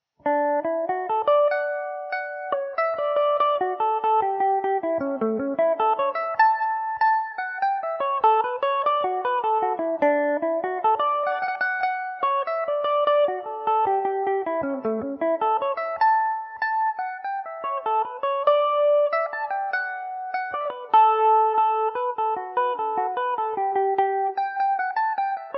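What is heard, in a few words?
D major, Irish mandolin; Graham Townsend’s; Revelation Jaguar electric mandolin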